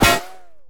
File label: Shot Pipe; MiSide